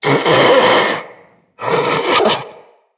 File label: Infested Puss Ancient Idle